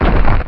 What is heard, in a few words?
scrub crumble; zelda